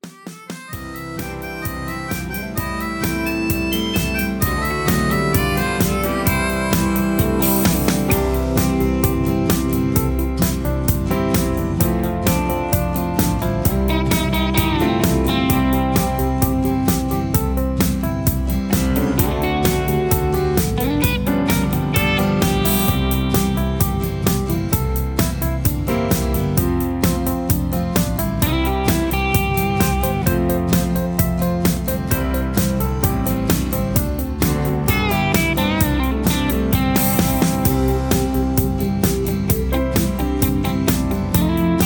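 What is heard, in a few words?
Instrumental with background vocals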